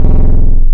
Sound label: Digital Resonator, wavetables; Animoog